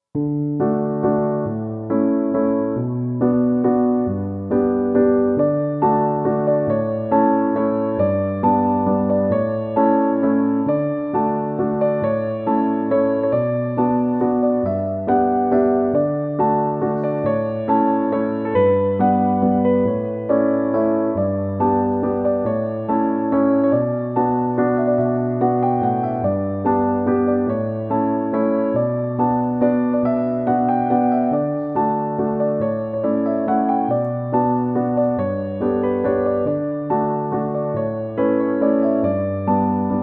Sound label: Calm Spring